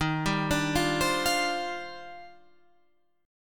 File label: D sharp Major 13th, guitar chord